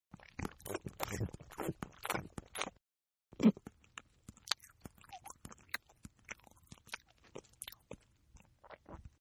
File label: Eating; Sound Effects:Human; Partners In Rhyme